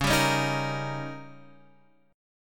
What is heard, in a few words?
Eb 7th